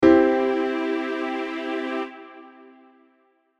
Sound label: C Major Chord